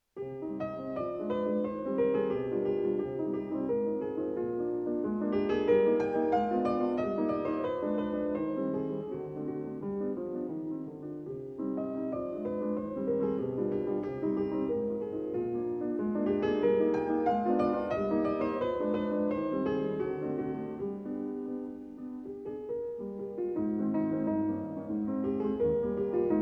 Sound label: Songs without Words for piano in C minor; Book 3, Op. 38: No. 2; Mendelssohn